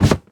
Jump Wood Jump; Devour

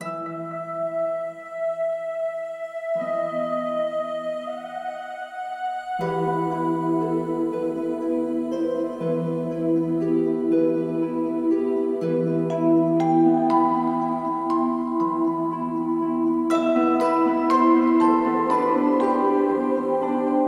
music bell Cutscene

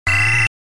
Figer Firing; Dig Dug; arcade

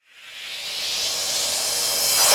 boss rampup 200bpm; 8beats; chicory a colorful tale; ps4

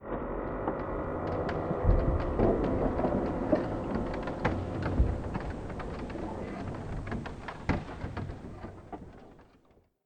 Ambience wood; adams venture origins

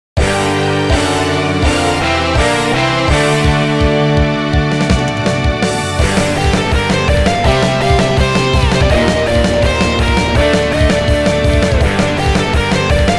music quest boss

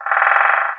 Raptor Idle

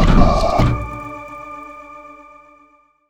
Save Station Open

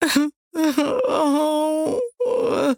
moan

